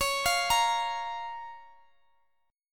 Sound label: Db 6th, Guitar, DADGAD